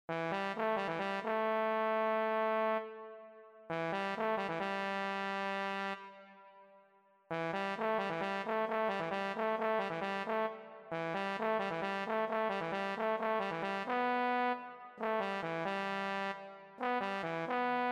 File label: euphonium